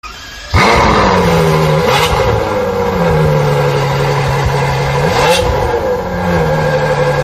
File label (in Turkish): araba sesleri